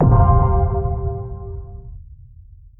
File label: feature unlocked; ffxiv